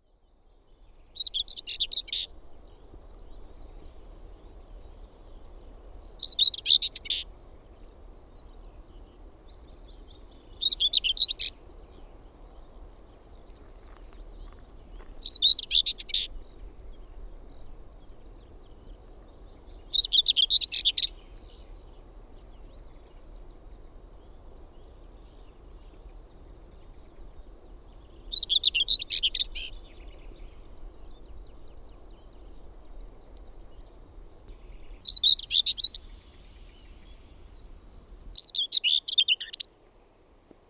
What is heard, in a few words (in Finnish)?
PENSASKERTTU, LINTUAANITYKSET